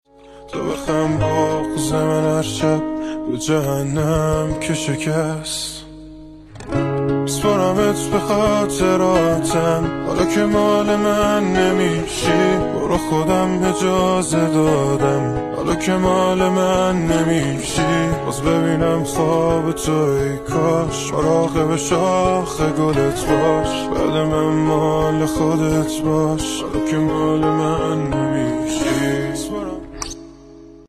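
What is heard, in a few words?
Gitar, guitar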